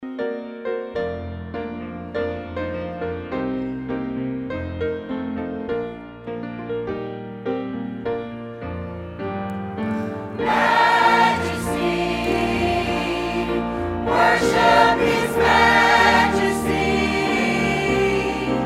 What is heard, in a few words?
Choir; Sunday Morning Worship